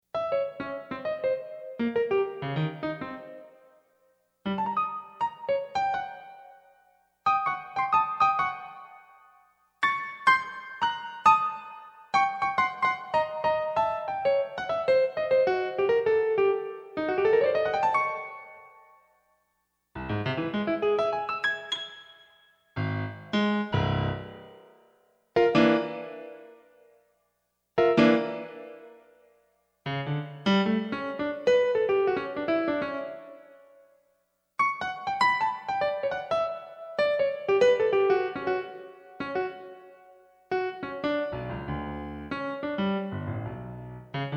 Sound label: Third Stream Fragments (piano)